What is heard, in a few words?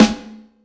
SP1200 snare